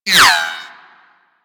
Bullet Whizzby; Warframe; Weapons

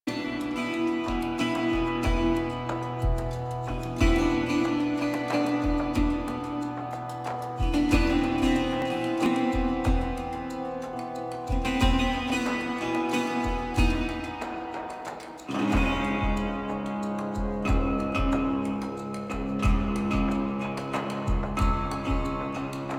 Menu Music Loop; UI; Archivist; Warframe; Duviri

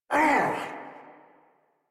Pain; Sargas Ruk Boss

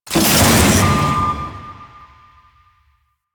Crew Ship Debris Release C; Warframe; Corpus Railjack; Crew Ships

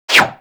Bullet Parry Metal; Warframe; Bullet Parries

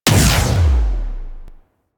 Melee Heavy Air Slam Impact; Weapons; Whooshes; Warframe

